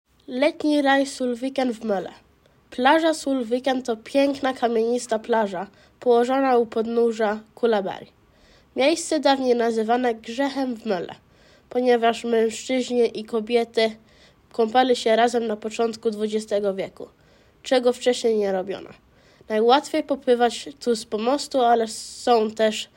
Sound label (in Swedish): polska; Solviken